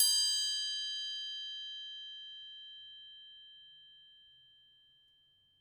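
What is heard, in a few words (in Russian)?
звонок высокий близкий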